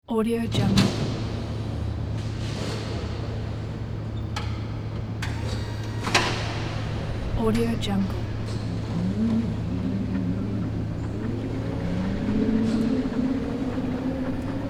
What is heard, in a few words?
airplane hangar